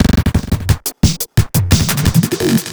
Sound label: Glitched Beat 175BPM; glitch kit